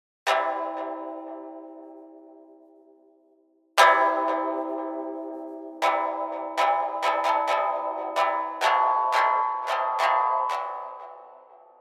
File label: Strum GS